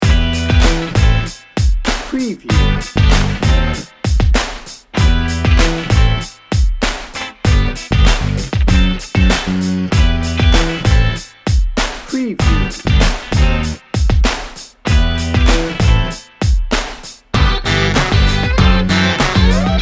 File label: hip-hop funky loop